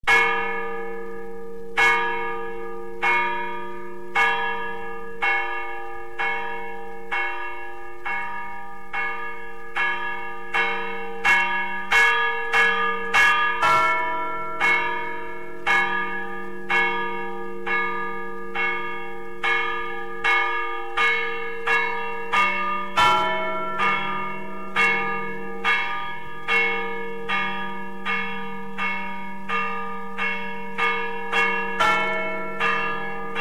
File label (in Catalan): campana